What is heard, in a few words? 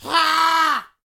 Goblin Scream; SyrinScape